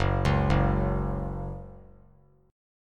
Gb 5th Gb down; Gb5 arpeggiated; JGuitar

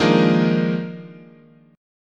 Eb Major 7th Suspended 2nd Suspended 4th, chord

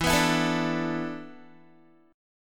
E 7th Suspended 4th, Guitar; E7sus4; strummed; 12,14,14,14,14,x

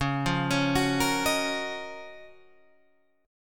F sharp Major 13th C sharp

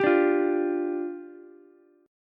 D Minor Double Flat 5th, down, Ukulele; Dmbb5; strummed; JGuitar

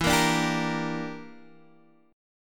E Minor 7th Flat 5th, Guitar, DADGAD

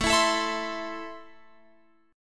A Minor, Guitar, Open F; x,16,16,16,19,19; strummed

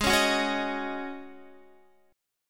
G sharp 7th Suspended 4th G sharp, Guitar

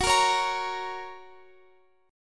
Gb Major Gb Guitar Open F; strummed

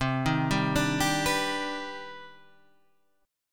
C Minor Major 9th, chord; CmM9; {8 6 5 7 8 7} arpeggiated

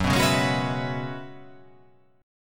F sharp 9th Suspended 4th F sharp, chord

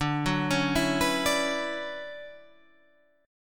D Major 13th D down Guitar Standard; arpeggiated; capo 9

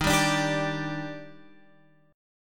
Eb Major 7th, Guitar, Open F; EbM7; strummed

